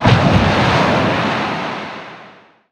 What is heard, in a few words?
pounce cast; weapons; immortal; dota; slark